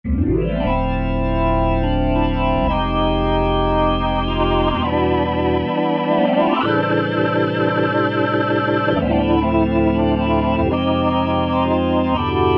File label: Drawbars, Generalmusic Equinox